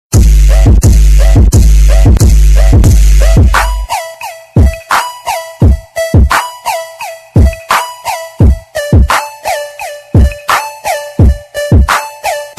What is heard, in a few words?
bass with beats, rock